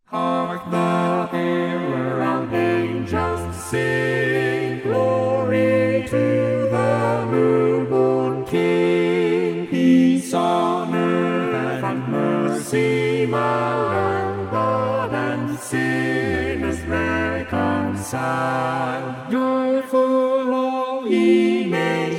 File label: Hark the Herald angels sing vocaloid